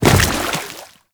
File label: Step Water, Footsteps; Warframe; Grineer